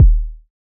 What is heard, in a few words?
Kick Drum D 1